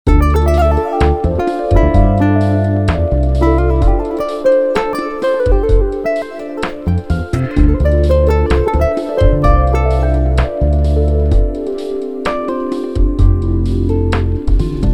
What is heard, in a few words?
jingle guitarro